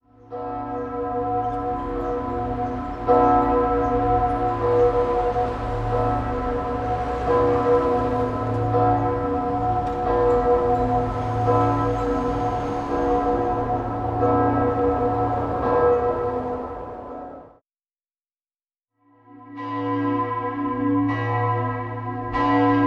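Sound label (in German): gleiche Glocke mit zu leichtem Klöppel kurz nach Einbau und nach 5 Jahren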